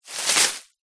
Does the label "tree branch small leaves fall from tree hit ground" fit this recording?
Yes